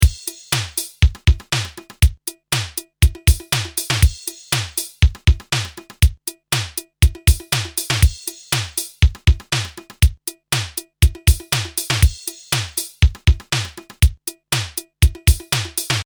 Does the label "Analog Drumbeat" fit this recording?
Yes